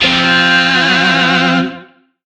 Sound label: Shawzin Chromatic Chord; Warframe; Lizzie Shawzin